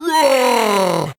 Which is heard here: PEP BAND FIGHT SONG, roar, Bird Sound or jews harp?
roar